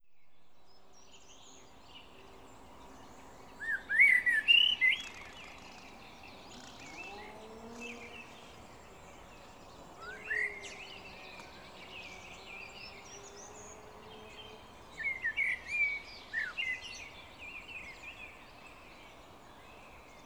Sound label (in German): Dorfrand Wiese Wald Sound Aufnahmen vom Waldrand Vogelgesang Waldrand